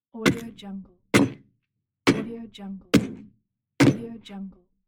chopping wood axe

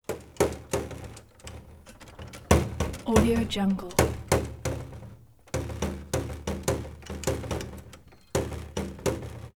barrel organ cranking bouncy banging hits

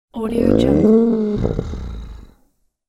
dinosaur short munch

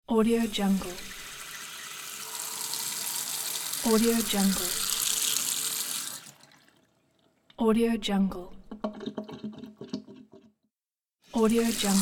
water in the sink

